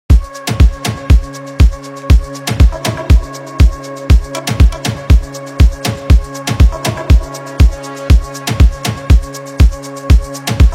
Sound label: Afro House Remix; EAR CANDY + VIBES